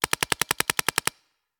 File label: Paintball Gun; Sound Ideas; Guns